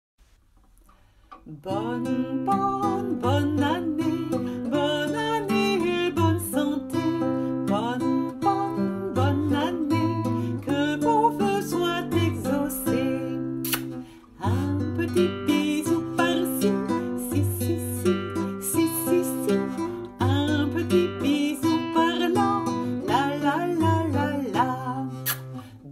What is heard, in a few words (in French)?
BONNE ANNEE chanson enfantine